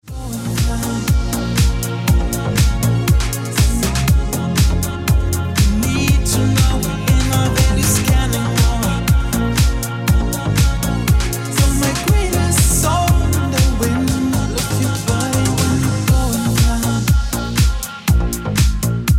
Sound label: Remix Ringtone